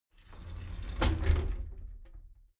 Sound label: shower slide door inside close soft halfway